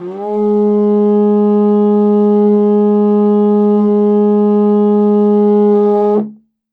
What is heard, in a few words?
rag dun tenor horn, g#2; World Instruments 2; WOODWIND AND BRASS